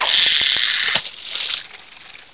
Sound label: Flash cast; austrlia